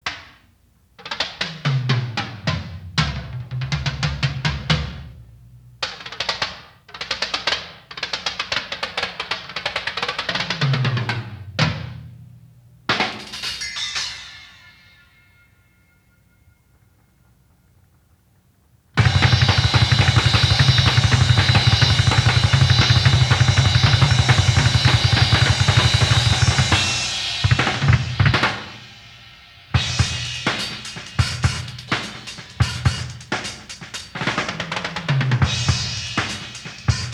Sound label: Drum Solo